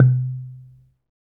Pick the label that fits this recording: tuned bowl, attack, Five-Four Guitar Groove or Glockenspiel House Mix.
tuned bowl